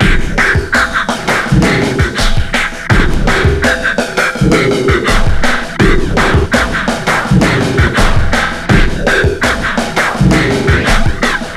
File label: WEIRD BEAT, 83 bpm, Breaks; POTBreaks